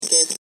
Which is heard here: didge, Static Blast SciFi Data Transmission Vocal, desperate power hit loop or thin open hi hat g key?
Static Blast SciFi Data Transmission Vocal